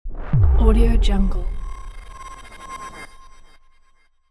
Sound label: hard landing